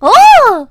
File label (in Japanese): 悲鳴